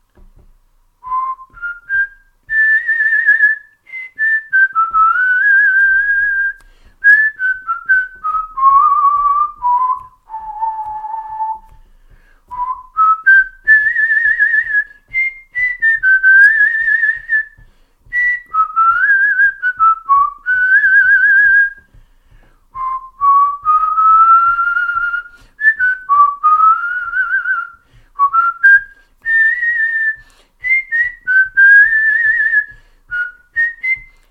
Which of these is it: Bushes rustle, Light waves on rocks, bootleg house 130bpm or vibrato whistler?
vibrato whistler